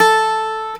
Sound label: C GTR HARD A3, Celtic Flavours; USB Soundscan vol.42; AKAI